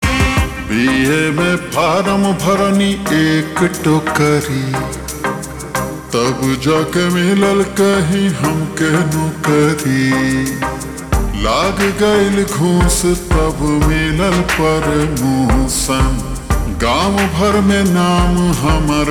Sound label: Slowed Reverb